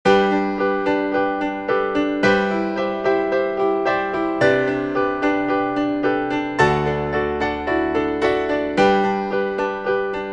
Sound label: Piano Piece